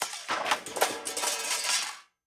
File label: Metal, Crash, Concrete, Sheet Metal, 20 Gauge, Slow, Complex; Airborne Sound; Elements Metal